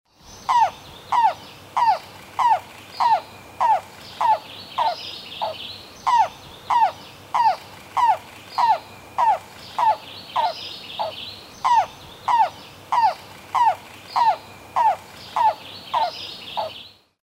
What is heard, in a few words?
yellow-billed cuckoo coo-call; mike nelson